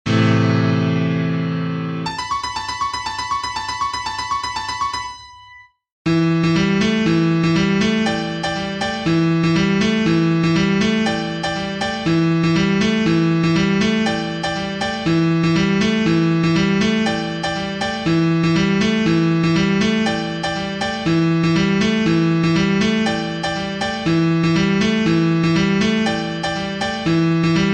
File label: piano